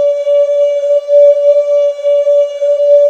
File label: HIMONIOUS, HARMONIOUS; Spectrasonic Distorted Reality 1